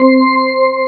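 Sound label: ORGN -L, organ, Drum & Bass, 165 BPM; Ueberschall; Drum & Bass Resonance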